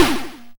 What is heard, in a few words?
Snare, YM, CHIPSHOP HITS DRUMS; ChipShop Deluxe